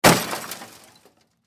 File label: Impact Crash Object Metal Glass Plastic Hit Smash; Crashes; Sound Effects:Objects; Blastwave